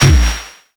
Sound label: Boss Attack